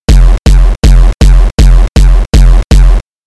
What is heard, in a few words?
kick